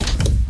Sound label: Create Window